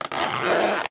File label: Metal Zipper, Clothing